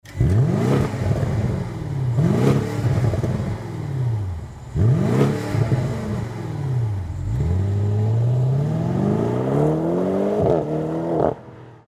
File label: BMW M550i G30 4,4l 340kW Sport Mode; SERIE